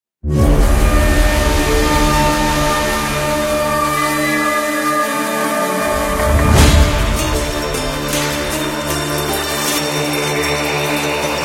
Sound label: boss intro; zombiesurvival